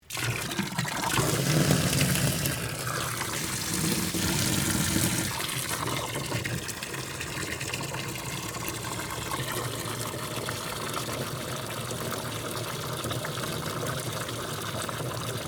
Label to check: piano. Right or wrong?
Wrong